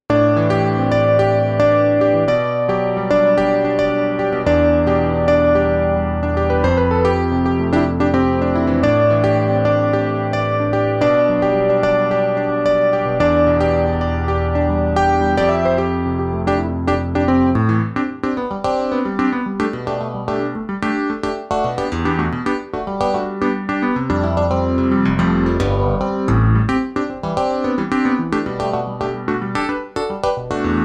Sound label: Electric Grand C70P; sampletank4; electromagnetik